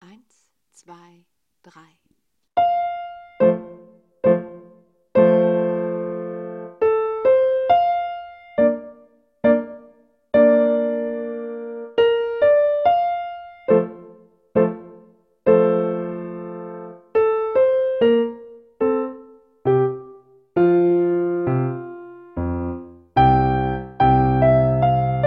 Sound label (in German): Menuett in F-Dur, Piano; Play Piano Klassik